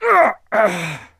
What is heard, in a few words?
Human Male Death Short; Pain Train